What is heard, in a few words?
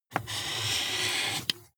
marker mark soft